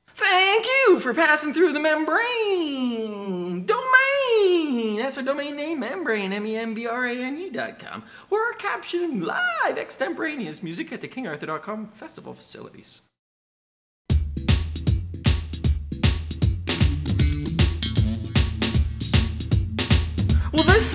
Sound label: Laughter